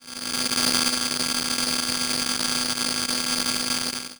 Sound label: static